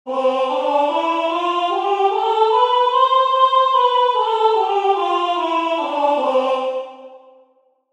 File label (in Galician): escala